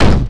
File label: Kick crunchy; Sonic Foundry (Sony Creative Software); Ugly Remnants VOL-2; Beatstuff